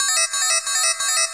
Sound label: 180 frenetic-synth-octaves, synth and electronic loops